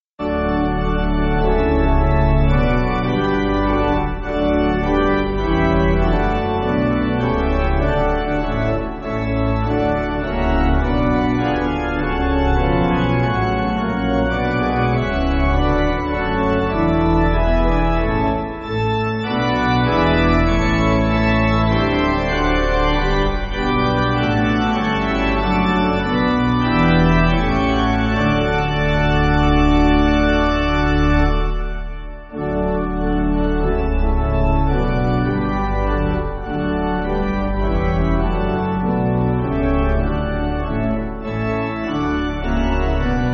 Pipe, Pipe Organ, Backing Files for Churches

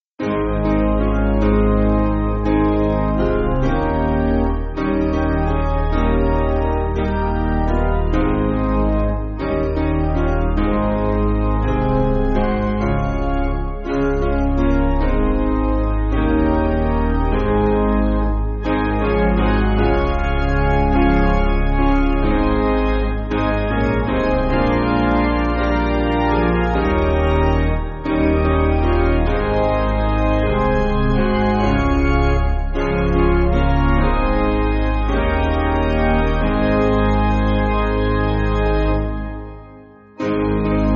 Hymnary Piano

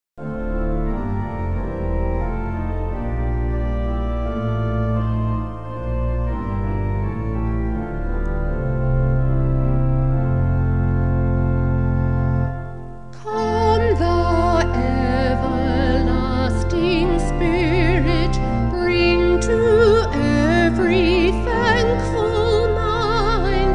Come Now Everlasting Vocal Organ

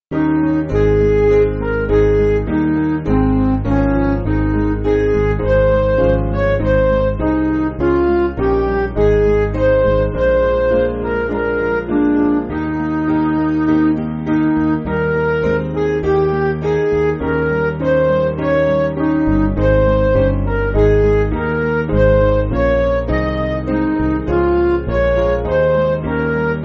Midi

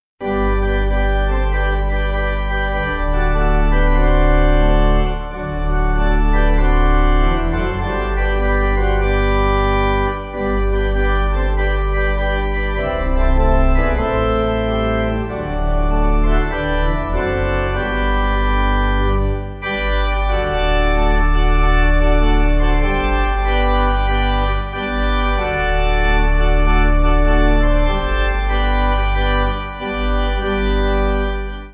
Pipe

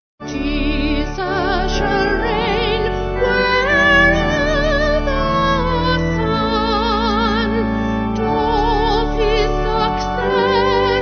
Vocal Organ; Jesus Shall Reign; Duke

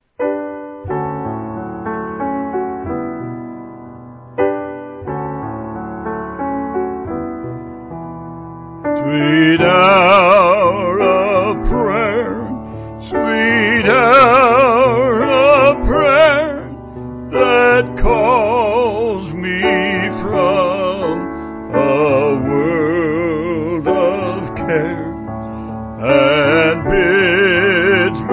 Vocal Piano; Sweet Hour Of Prayer

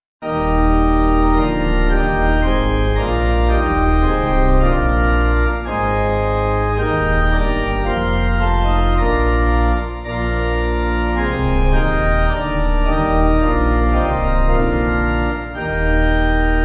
When All Thy Mercies, Pipe; Durham

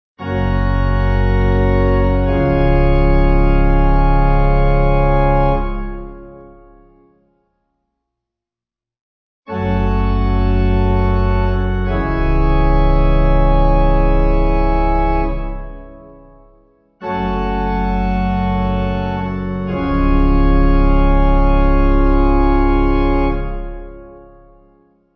amens simple Db pipe

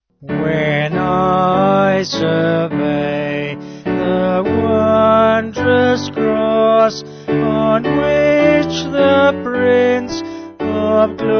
Vocal Piano; When I Survey; Rockingham

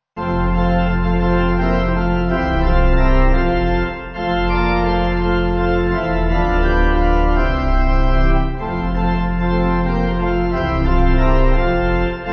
Pipe

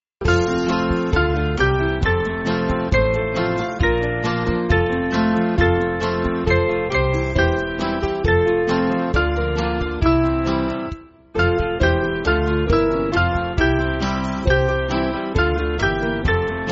Band, church music; Upon The Cross; O Welt Ich

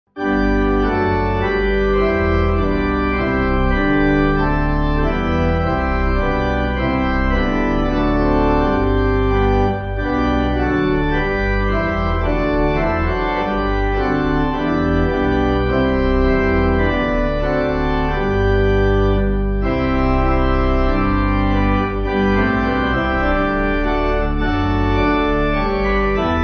Pipe; Come Thou Fount; Corinth

